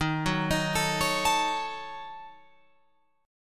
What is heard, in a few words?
D sharp Minor 7th Flat 5th, Guitar, Open F; arpeggiated